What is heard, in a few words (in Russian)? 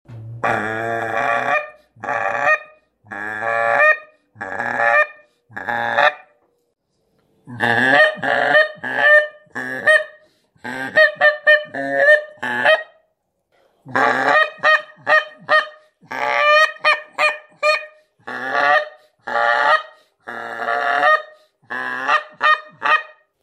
канадская казарка